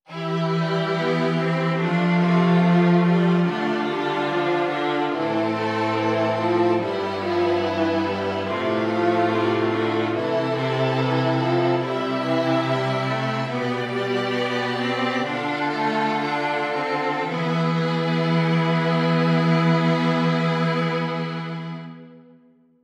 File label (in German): Stimmiger Contrapunkt; Ronge